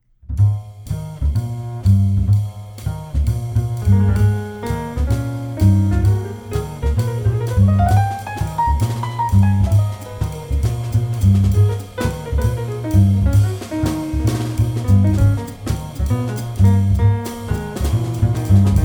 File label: A gentle Waltz